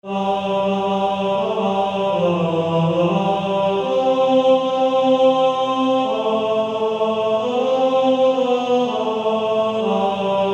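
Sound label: Male Choir, tenor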